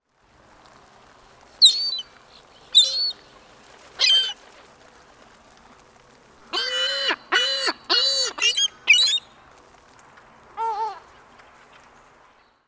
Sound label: Ring-billed Gull Larus delawarensis